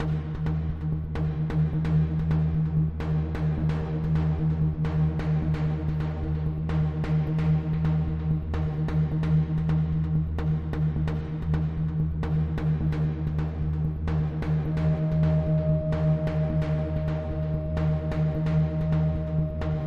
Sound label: Menace Drone Aggressive Synth